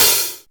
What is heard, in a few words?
OPEN HH; USB Soundscan vol.51; AKAI